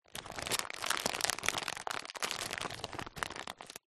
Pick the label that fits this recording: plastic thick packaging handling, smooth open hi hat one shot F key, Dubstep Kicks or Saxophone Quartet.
plastic thick packaging handling